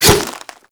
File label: Infested Charger Swipe